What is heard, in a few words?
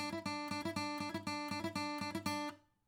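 acoustic pattern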